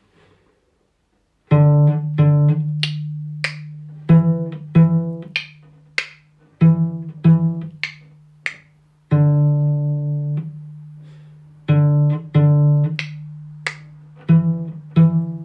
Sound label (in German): pizzicato, Cello